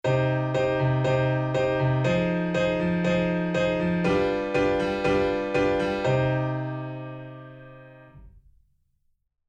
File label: cm fm7 g7 cm